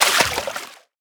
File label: Object Enter Water